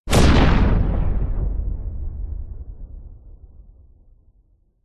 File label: science fiction explosion passing spacecraft blow up; zapsplat